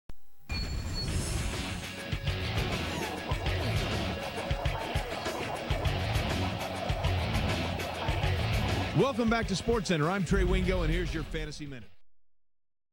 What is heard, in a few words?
jingle; espn; sportcenter